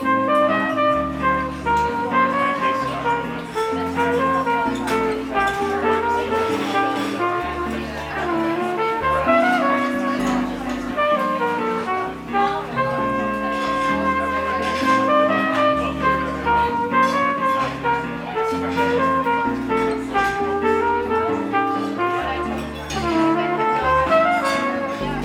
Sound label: lounge music loop; City of Villains soundtrack